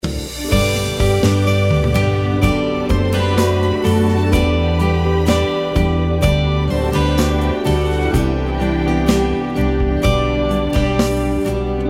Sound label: Instrumental